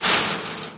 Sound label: chainlink, player footsteps survivor walk